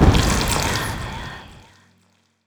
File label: shadow create hold tail